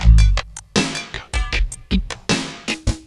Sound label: KORG WAVESTATION